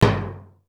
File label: SURDO 2; East West; Global Sounds